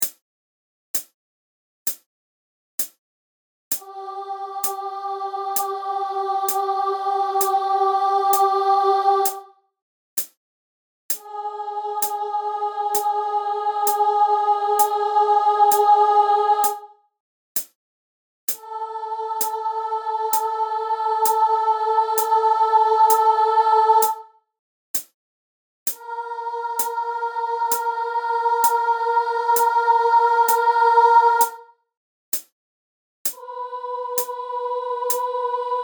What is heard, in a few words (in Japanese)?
裏声練習フレーズ Men